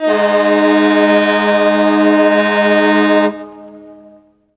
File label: ferry horn; Tony Hawk's Project 8; XBOX